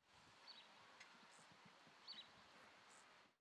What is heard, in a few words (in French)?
Pépillement, Friche Industrielle Rhinau